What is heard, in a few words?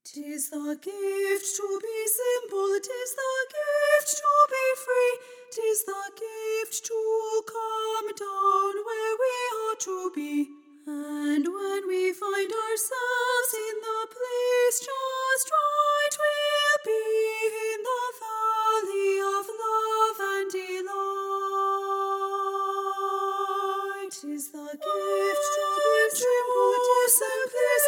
Simple Gifts Bari Low Mute